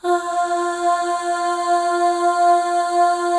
Voices, FEM AHS; Spectrasonics; FEM.2 AHS

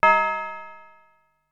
Tubular Loop, Ensoniq SQ-R-Plus

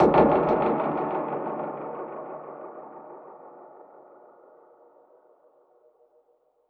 Perc Hit, dub percussion; musicradar

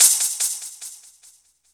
DANCE SET, DRUMS

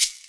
Maraca; Sonic Foundry (Sony Creative Software); Joe Vitale Latin Percussion

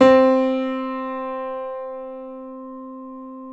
PNO F; Synclavier Sampler Library

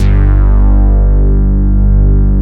JD BASS; East West - Phat & Phunky

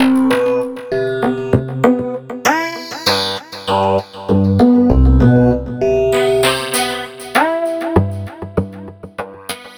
VA Synthesis, Keyboards

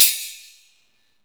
SPLASH, Akai MPC2000

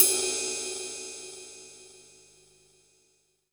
Ride, Drum & Bass, Hats & Rides; AMG - Prototype Drum & Bass

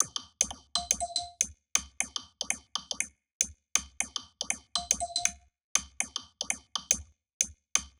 Loop 120, Perc Loops

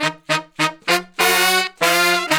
Horn Section

